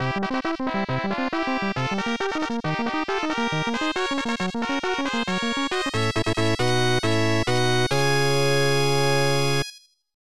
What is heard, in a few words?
Winner Jingle; Pro Yakyuu Nettou Puzzle Stadium; SNES